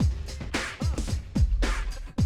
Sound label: drum loop, 100 BPM